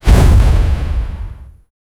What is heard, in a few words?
long fall a body hurtles